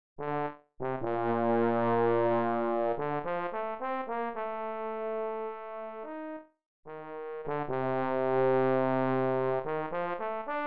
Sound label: Baritone T.C.